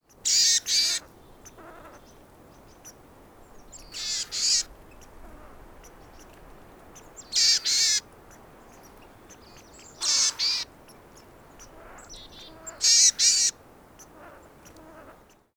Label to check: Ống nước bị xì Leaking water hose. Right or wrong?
Wrong